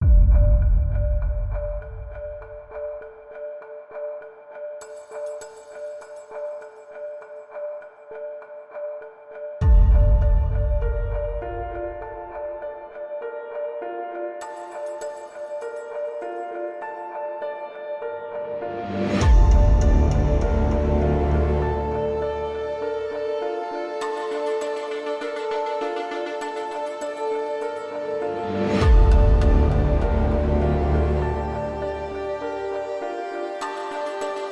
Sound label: Orchestral Essentials; ProjectSAM